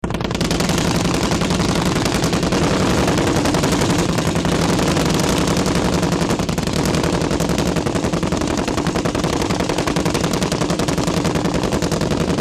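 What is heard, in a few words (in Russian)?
пулемёт строчит из танка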